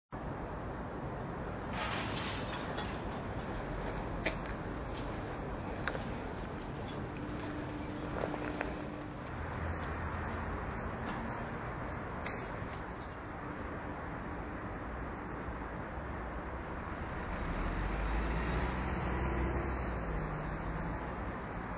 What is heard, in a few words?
ambience location